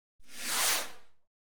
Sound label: Fireworks, Rocket; BBC Sound Effects Library; Explosions Guns Alarms